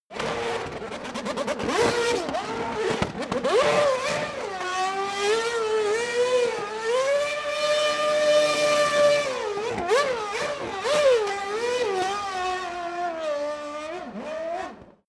racing car f1 celebrate madness

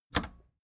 record player power click on fast

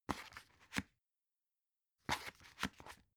dvd case remove booklet

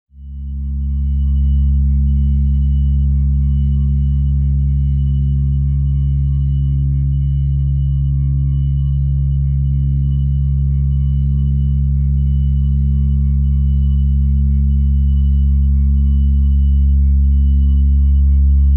organ tone c sharp

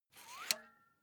air pump small out

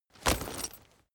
leather metal impact chink

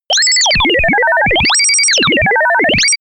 telegraphic synth

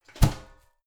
garbage can close lid hard damped

